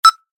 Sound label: Simple beep tone